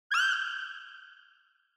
Horror Scream Low Reverb

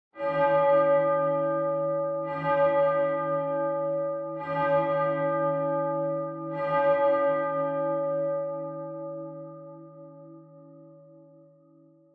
Church Bell, Far Low Long

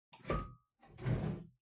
Pendulum Clock Hardware Far Open

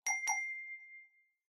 Smartphone UI Sound Dry

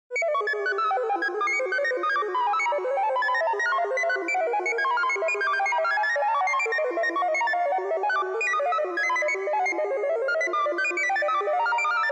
Cyber

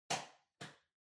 Washer Front Load Noise Far Lock

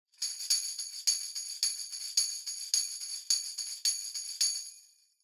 Tambourine Pattern

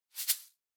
Shaker Single

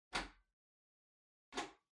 Ceiling Light (Far Pull)

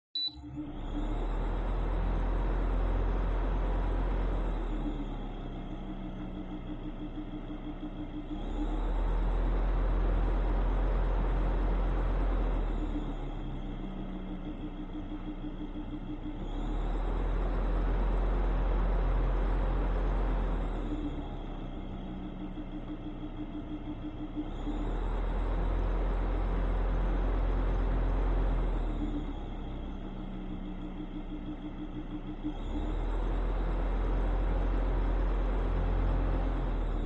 Electric Fan Rhythm Low

